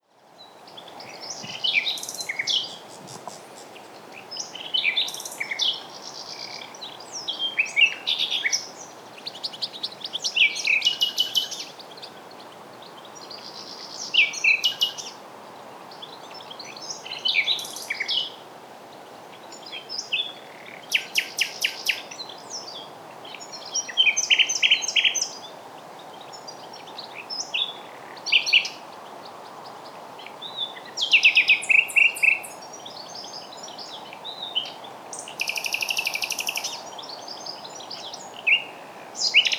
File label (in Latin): Luscinia megarhynchos; SETE